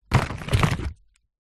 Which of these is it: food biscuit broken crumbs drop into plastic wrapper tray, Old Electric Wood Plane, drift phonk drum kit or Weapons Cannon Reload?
food biscuit broken crumbs drop into plastic wrapper tray